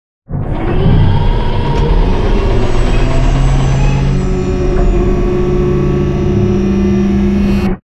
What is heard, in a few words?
Giant Floor Panel Assemble Rotate Servo; Boomtown; Sideways Town; Titanfall 2